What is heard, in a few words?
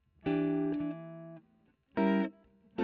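electric guitar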